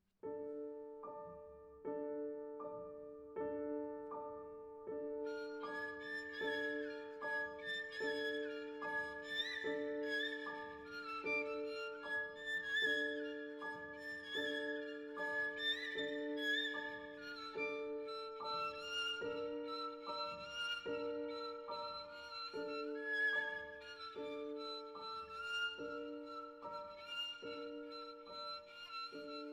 Romanian Folk Dances for violin & piano, On the spot; Bartók; Pe loc